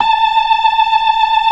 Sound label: FAST VIB, ORGAN, TREMOLO VIB